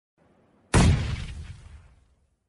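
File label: grenade, bomb; pubg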